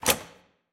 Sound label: Key Open